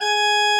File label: Church Organ G#5; Best Service; Advanced Media Trax Modern Composer